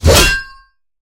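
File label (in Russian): богатырский меч достают из ножен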